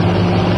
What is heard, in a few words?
V8 Idle; 暴力赛车